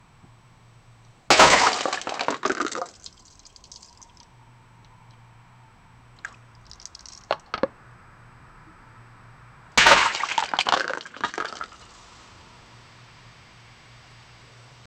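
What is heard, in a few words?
a plastic glass filled with; asphalt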